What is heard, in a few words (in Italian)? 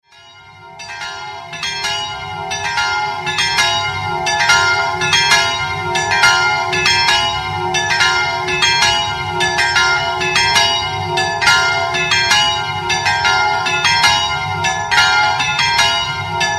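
PIANI DI SOTTO di Chiusaforte, campane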